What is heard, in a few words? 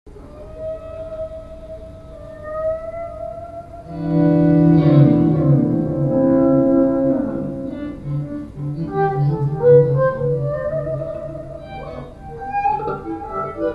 Jam Session; Taipei